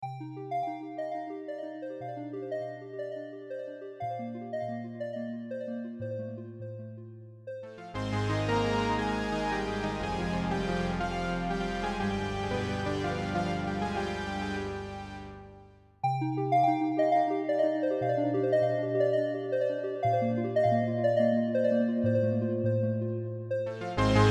leads wet chorus

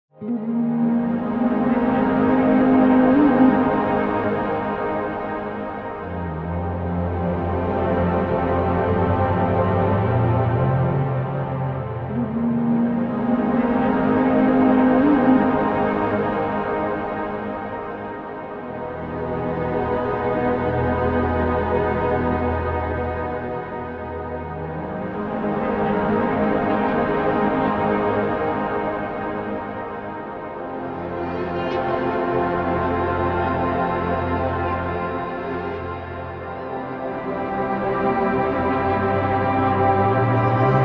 guitars in space guitar cosm

